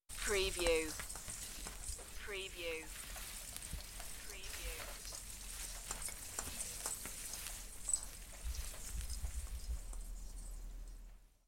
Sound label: Artificial Christmas tree being decorated; beanstalk audio